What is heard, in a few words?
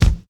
mid-range kick drum one shot e key, kick drum sound tuned to the E note; kick drum samples